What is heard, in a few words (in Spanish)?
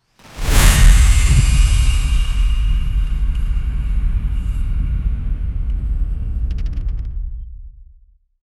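en español sonido cinemático, dark magic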